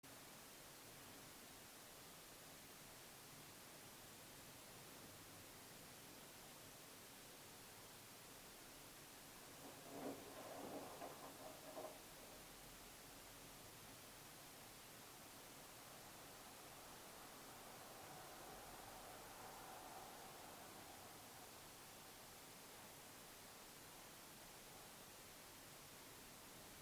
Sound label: dragging creaking; Devoe House; EVP